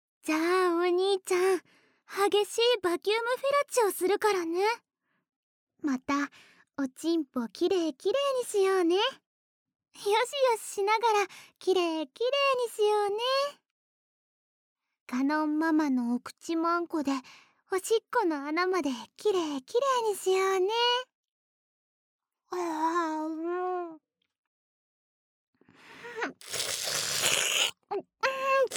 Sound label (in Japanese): バキュームフェラチオ（激しいVer）